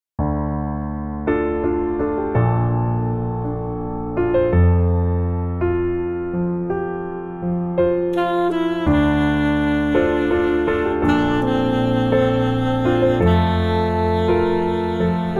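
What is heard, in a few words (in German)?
Tenorsaxophon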